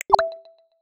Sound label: MESSAGE; Nokia X100